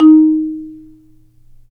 Af. Marimbas; Roland LCDP11; Africa VOL-1

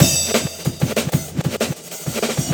processed acoustic drum kit; FRANTIC KIT

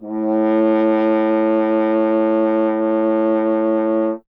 F. Horn; Miroslav Vitous; Solo Instruments VOL-2

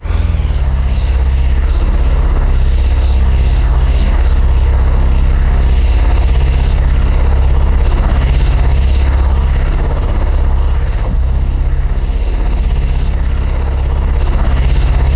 C1, Synth Leads

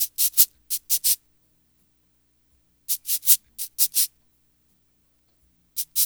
CABASA; East West; Funky Ass Loops

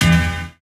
chords

